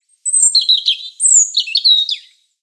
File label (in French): Oiseau Rouge gorge